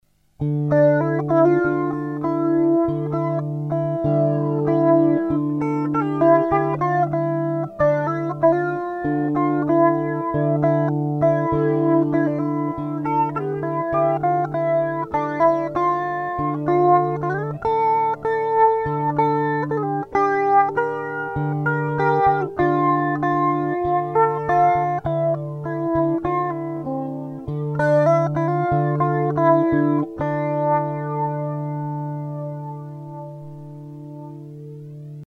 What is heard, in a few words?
Flange Guitar on